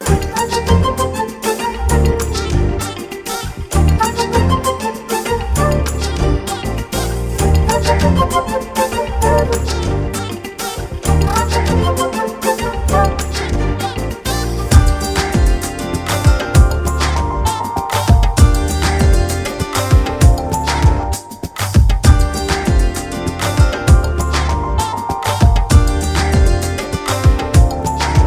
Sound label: Love BGM; Sundarakanda